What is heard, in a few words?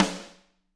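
snare drum; Bob Clearmountain Drums I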